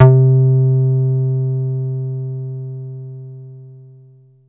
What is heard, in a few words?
303-Style single hits; FM 57 - The Ultimate Sample Collection (1997)